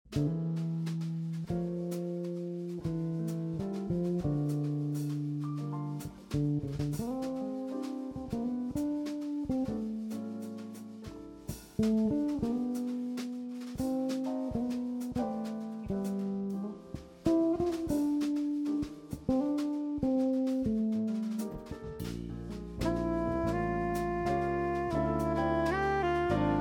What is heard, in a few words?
waltz for dave